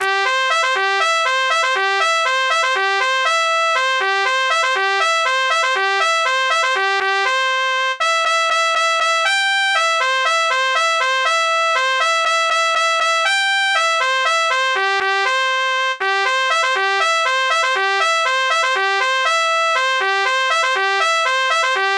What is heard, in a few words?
Reveille